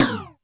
Hi Thang, Drum Machines Alesis D4

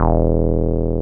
303 SQR E2 F, SQUARE BS

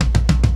105 TOM FILL, drums; LOOPS; Basement drums vol 2

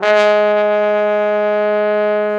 TROMBO; Trombone

